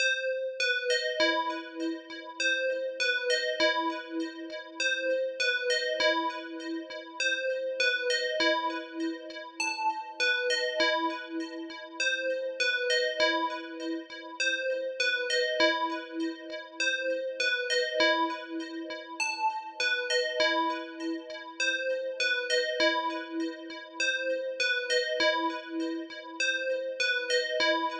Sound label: Bell